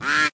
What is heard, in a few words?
quack, mallard